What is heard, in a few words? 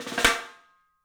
MARCHIN ROL; 3D Sound; 3D Drums